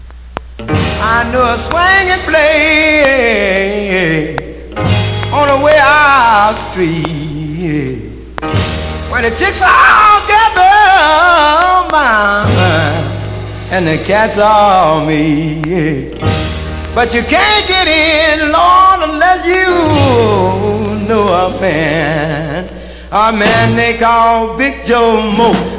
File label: singin